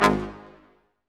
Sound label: BRS Quintet staccatos, Symphony Orchestra; Roland LCDP08